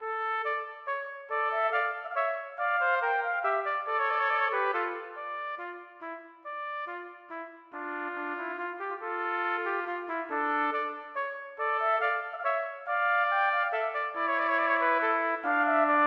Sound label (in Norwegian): Menuet for trompetduett; Aamodt